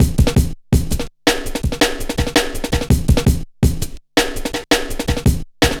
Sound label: Cool, Drum Loops, 165.5, Jungle; Zero-G Jungle Warfare 1, 2 & 3